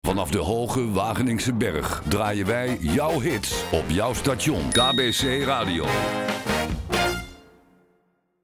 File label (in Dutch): Vanaf de hoge ping met achtergrondmuziek